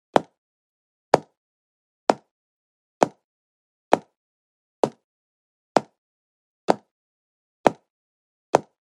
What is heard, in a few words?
Footsteps